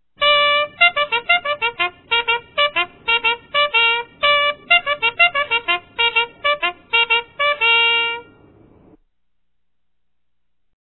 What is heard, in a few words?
bugles, soldier; dod